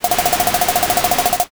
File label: printer